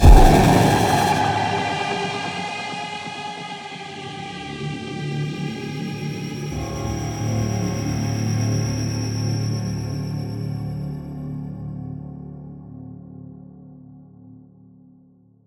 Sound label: Robot Attack; soma gamerip 2015; Robot Attack Event